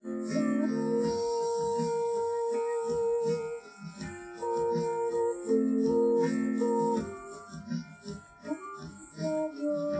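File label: with drums; SiSEC10; underdetermined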